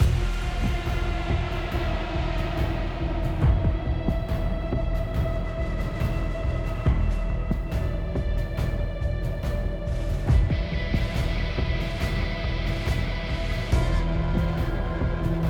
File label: Ui Battle Main